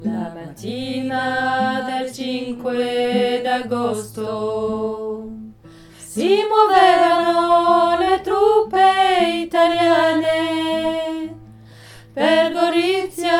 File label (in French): voix lead; Gorizia